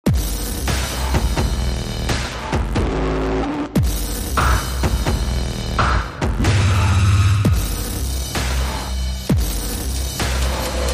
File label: Agitated, No Guitars